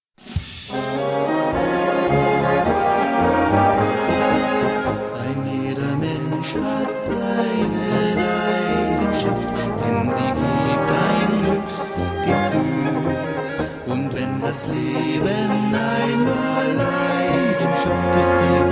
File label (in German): verrueckt nach guter blasmusik